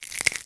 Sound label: horror joint rip tear break; zapsplat